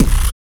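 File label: vox percussion, Vocal Planet Jazz FX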